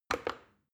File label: plastic button start click regular fast